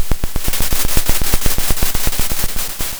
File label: Sound of a drum roll